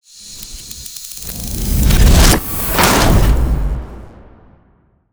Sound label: whoosh impact; Bluezone; Cinematic Impact Sound Effects